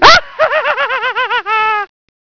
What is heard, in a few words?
laugh